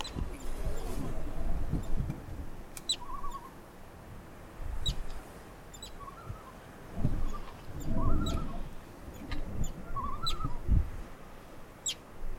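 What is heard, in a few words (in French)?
Plongeon imbrin sternes arctiques; islande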